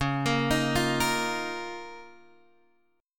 Db 6th Add 9th Db down Guitar Open G

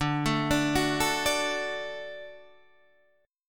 D Major D down Guitar Half Step Down

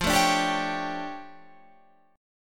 D Minor Major 11th, F

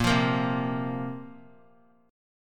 A sharp Suspended 2nd, down; strummed; JGuitar

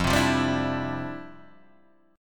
D Minor 11th F

